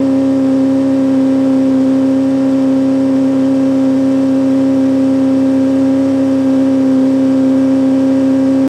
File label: 115 hp Evinrude Boat Drive Loop High Speed, On Board, Mono